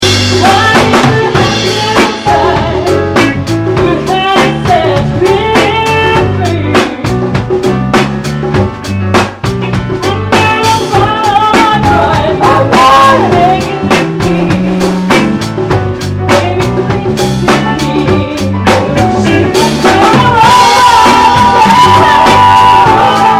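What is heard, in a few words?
Fender P Bass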